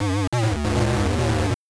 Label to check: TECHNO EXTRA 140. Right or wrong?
Right